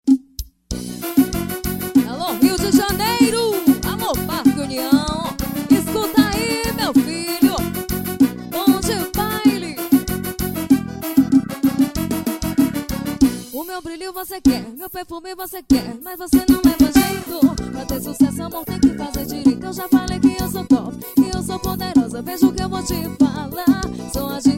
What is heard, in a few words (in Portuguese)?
forro promocional